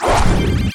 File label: Deadly swing; Pc Skill; FlyForInsanity